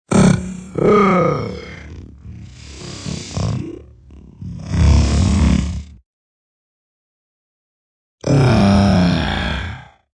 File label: Alient Snort, Metallic snort, sci-fi, FX from human voice; Warped; Sound Design; VoiceBox